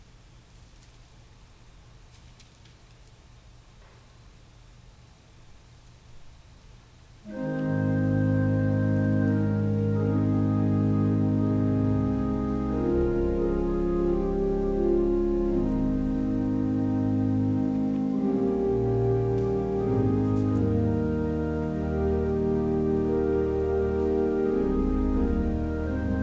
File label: Organ Music